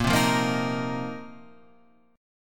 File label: A sharp Suspended 2nd Suspended 4th A sharp, Guitar, Half Step Down